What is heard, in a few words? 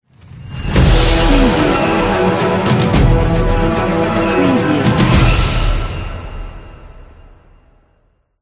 epic trailer logo